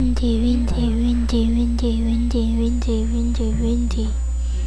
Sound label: windy